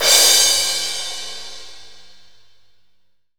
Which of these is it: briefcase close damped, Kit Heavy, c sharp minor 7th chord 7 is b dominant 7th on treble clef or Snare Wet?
Kit Heavy